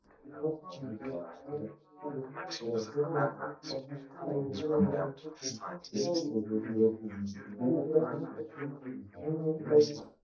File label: male, 380ms 4cm; SiSEC11; underdetermined